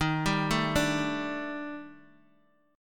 D sharp Major 7th Flat 5th, down, Bass Standard; arpeggiated; JGuitar

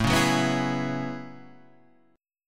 F sharp Minor 11th

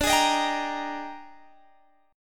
A sharp 13th

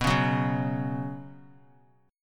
B Suspended 2nd, chord; strummed; JGuitar

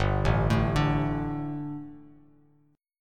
G Suspended 2nd G, down, Bass Standard; Gsus2 arpeggiated; JGuitar

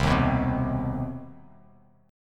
C Minor Major 7th down, Bass Standard, chord; strummed; JGuitar

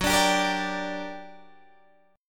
G Minor Major 7th, Gb, guitar chord

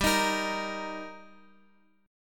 G Minor Sharp 5th G, Guitar, Open F; strummed; Gm#5

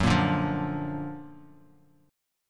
F sharp Augmented Major 7th F sharp Bass Standard; strummed

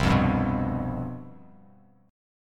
C Major 7th Suspended 4th, down, Bass Standard; strummed

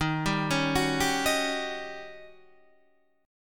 D sharp 7th Sharp 9th

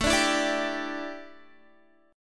A 7th Suspended 4th, A, down, Guitar

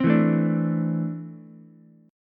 E 7th Suspended 4th Sharp 5th, Ukulele; E7sus4#5 strummed; 5,4,5,5; JGuitar

